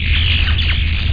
RAILGUN

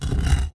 TURN; KRONDOR

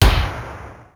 SPACE TOM; BEAT2000

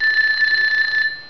TELEPHON; BELLS

